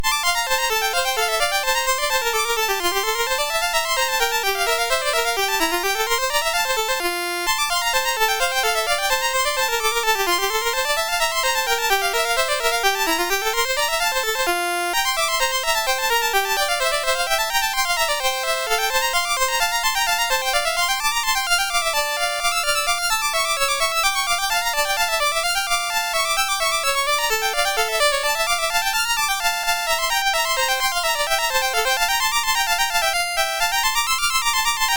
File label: Duet Brilliant, MOS6581